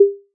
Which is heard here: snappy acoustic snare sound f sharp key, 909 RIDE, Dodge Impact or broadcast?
broadcast